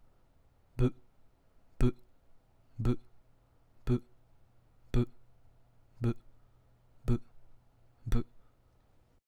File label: hihat, beatbox